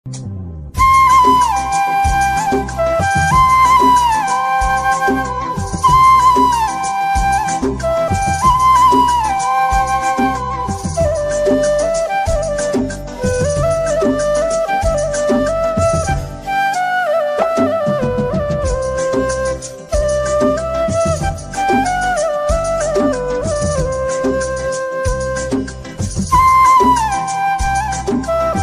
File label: flute music ringtone flute music flute cover